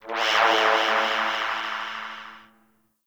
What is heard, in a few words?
Ship Mechanical Textures; Warframe; Corpus Ship Remaster